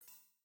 Coin; Alien Storm; Genesis

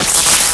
ROCK

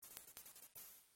Unknown Jingle; Sega Channel Demo Cartridge #4; Genesis

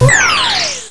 teleport out; mm7sfx

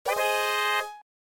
Bonus Game - Win; Animaniacs; Genesis

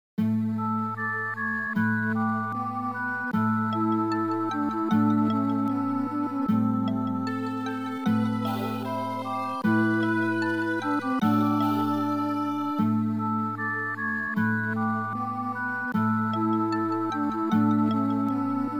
Peaceful; Arcade; Growl

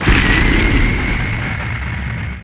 ROCK

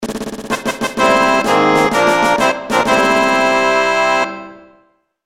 Fanfare (Variation); Final Fantasy 7

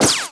ROCK

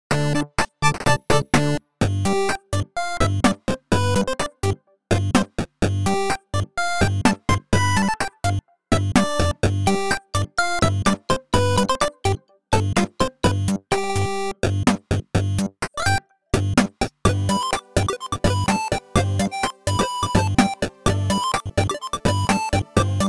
8-bit, chiptune; Nintendo Switch; Super Mario Odyssey; Run, Jump, Throw! 2

